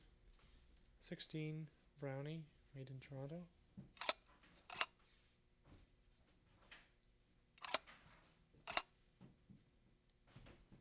OLD BOX CAMERA

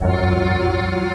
accordian guitar

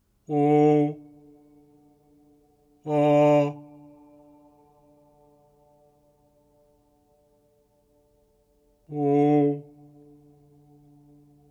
Symp Sung

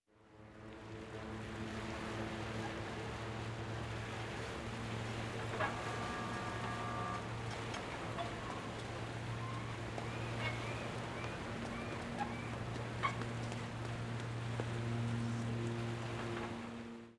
Ambience Harbour

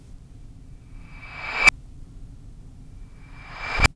Reverb Brick Reverse

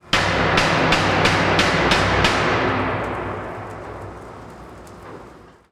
Hit, Earth & Steel

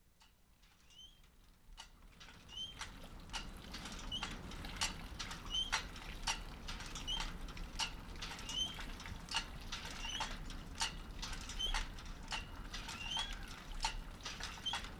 Pump, windmill pump; All sounds in the piece were recordings of wind in various environments (e.g. forest, wheatfield), or sounds activated by the wind (e.g. windmill pump, wind whistling through a shed) or distant sounds that are being diffused by

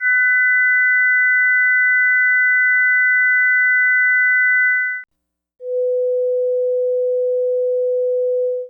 Difference Tone

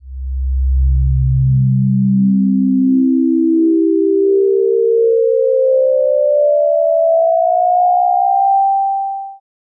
harm sweep

